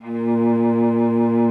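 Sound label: A#2, cello vibrato; Xsample VOL-7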